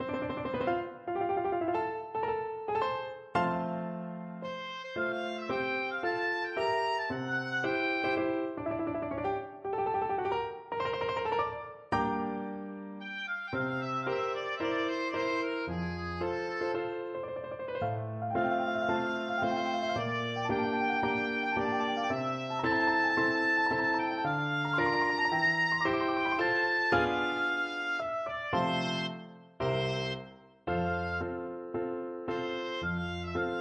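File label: Brazil national anthem, oboe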